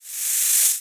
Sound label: Snake Hiss; Blastwave FX